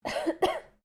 FEMALE COUGH; Female coughing and hiccups; Clearing Throat (Sound Effects:Human)

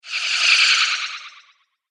Cartoon Magic Spell

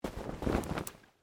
Clothing Shirt Cotton Move

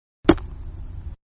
Baseball Softball Catch; Sports

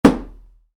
Wood, Light Impact: Plastic; Wood Sound Effects; Wood (Sound Effects:Materials); Stuart Duffield

